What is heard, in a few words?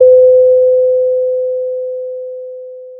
pop, Sound of a doorbell